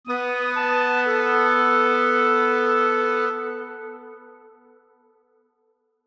Woodwinds Arpeggio, Woodwinds Arpeggio Descend Swell; Musical; Blastwave